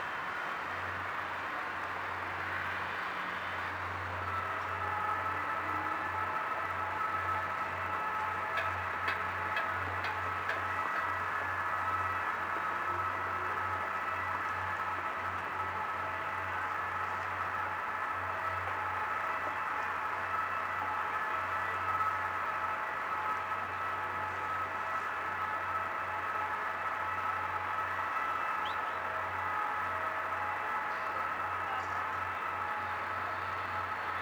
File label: Paris River, Ambience; Project Gotham Racing 2